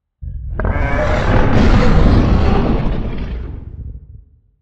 monster

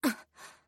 breathe shocked

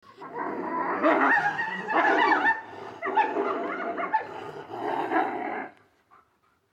wolves fighting

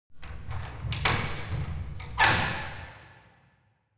old medieval door lock

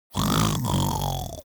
man strong snore

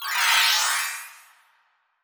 futuristic ship sweep pass by

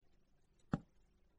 wood effect

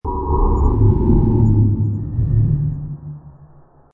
short scary sound

